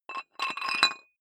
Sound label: dumbbell thread put on weight soft, fitnessstudio